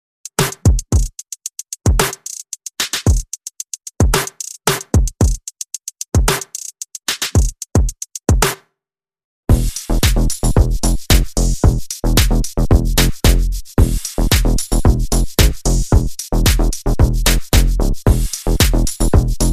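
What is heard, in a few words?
drum and bass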